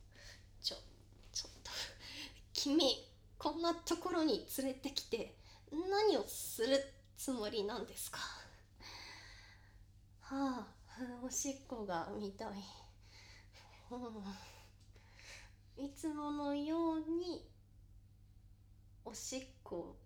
おしっこ（室内、洗面器） バイノーラル; 実演

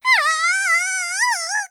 "ああああああ！", 元気; ハイグレボイス集

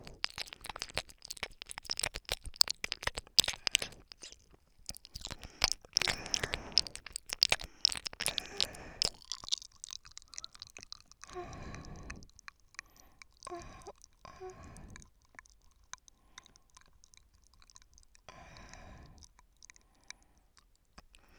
左耳深め＋吐息

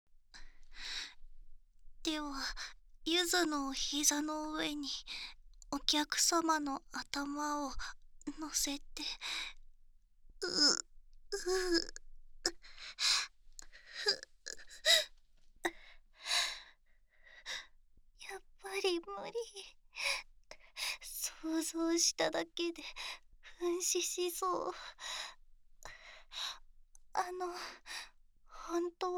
耳 マッサージ 耳毛剃; SE OFF

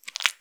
深めにストローク, ピストン運動 激しめ

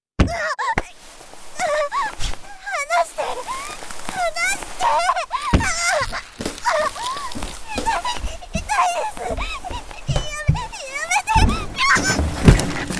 髪を引っ張る

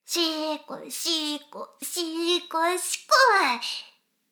しこしこ音声; 少佐殿; 牧場戦腺

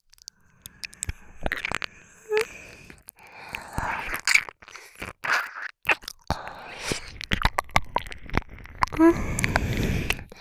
耳舐め 喘ぎ; 右差分; お屋敷メイドのハーレム淫語ヘヴン; バイノーラルオナサポ音声

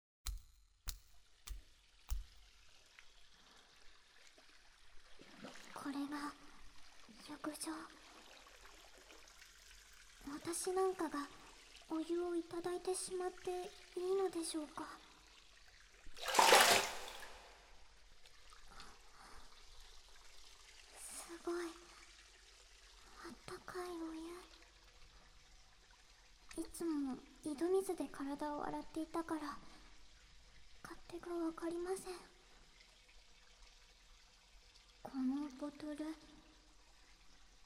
お風呂でシャンプー; 【癒し】吸血鬼と

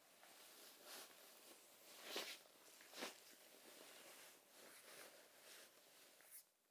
衣擦れ左へ; 動作系

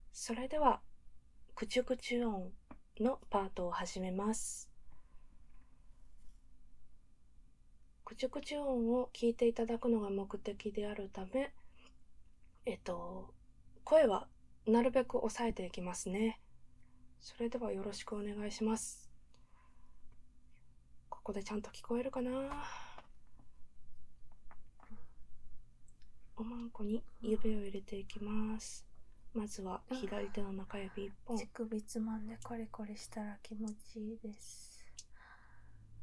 くちゅくちゅ オナニー + オナニー 実演 カウントダウン ステレオ

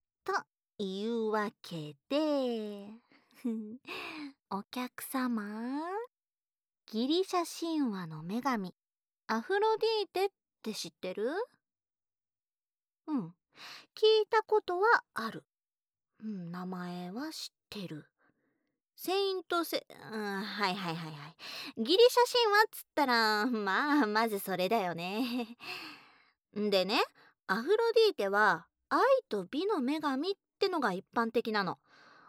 【耳舐め＆手コキ】

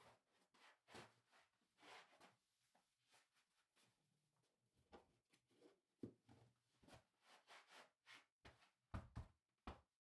スニーカーを履く; KU100; 色々な動作

